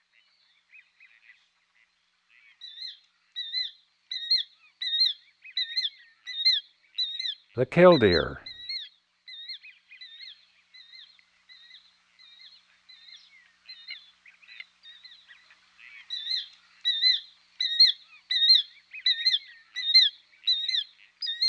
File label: Killdeer; Western North America; Dan Gibson; Wildlife Identification By Sound